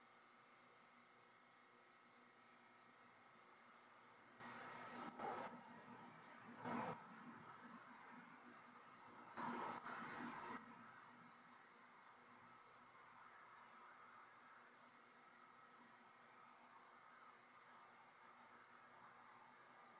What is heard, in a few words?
Chapel Static